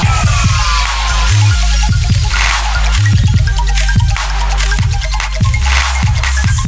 Trap Beat

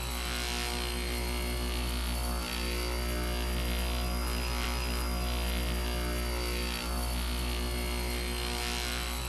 Generator Working; hidden deep windows gamerip 2022

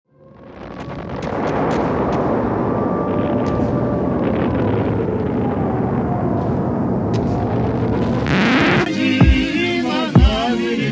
Hip-Hop; Sex With Stalin

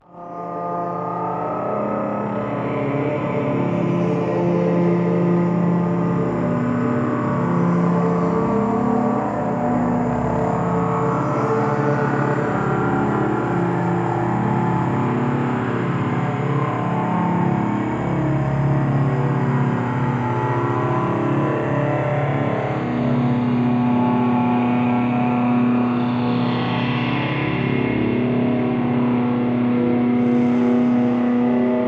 Space Glitch Drone; Warframe

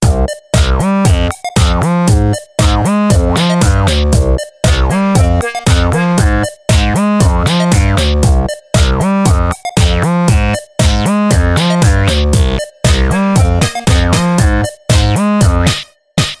Electronic; American McGee Presents Bad Day LA; Windows

